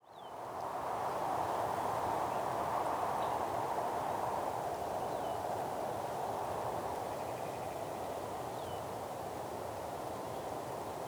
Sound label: Forest cold wind in alitude the morning, Indonesia; Charlie Atanasyan; Faunethic